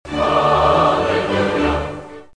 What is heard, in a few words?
halleluja